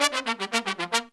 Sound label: Pop & Funk Brass, L